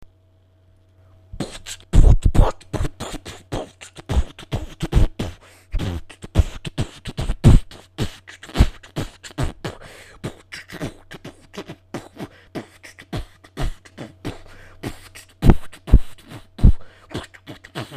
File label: Beatboxing